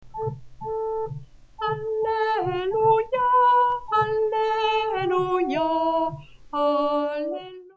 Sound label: Alleluia Soprano